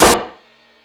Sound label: Sluggo Drums, Drum Machines